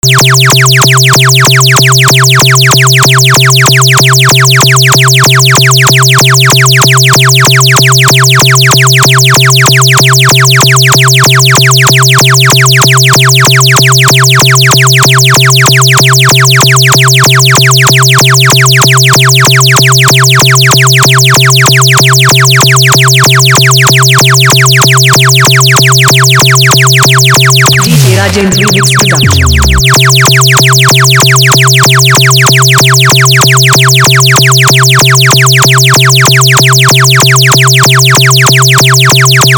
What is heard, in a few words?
Box Remix Vibration Beats Dj